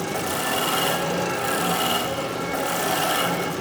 Switch Medium Loop; Ambience; Gameplay; Orokin Moon; Warframe

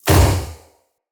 Ghost Flesh Impact; Weapon Impacts

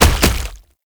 Proto Infested Gallop; Enemies; Steps; Warframe; Brachiolyst

